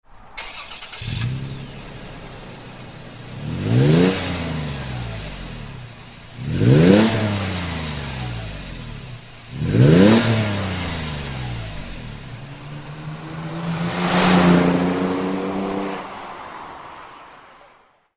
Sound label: magnaflow exhaust systems